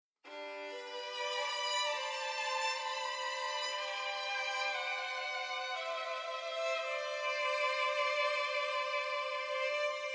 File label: Stinger Gameover Positive Stem Solo violin